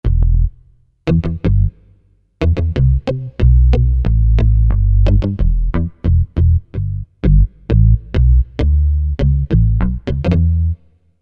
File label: Bass, Korg MSC-11S Brass; FirstBass